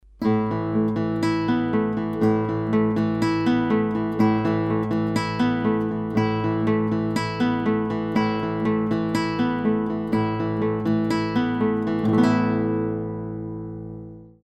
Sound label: Guitar